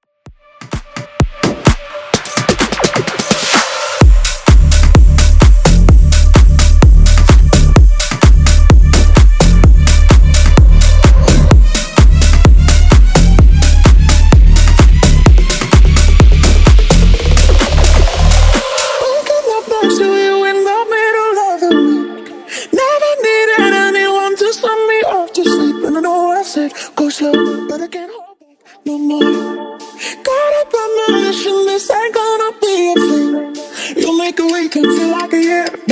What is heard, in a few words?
Bass House 128bpm